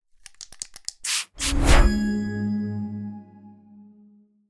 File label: logo jingle